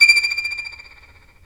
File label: STR Violin, Solo Violin FX